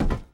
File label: iron walk